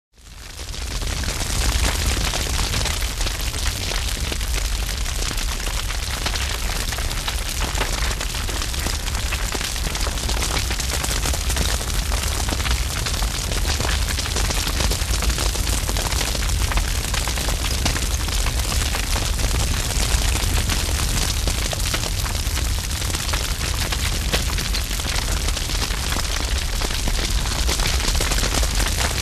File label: Big Fire burning close